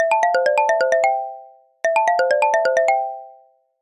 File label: ringing